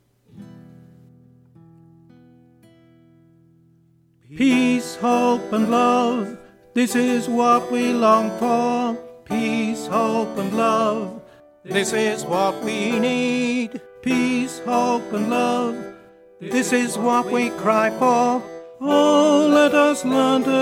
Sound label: tenor sung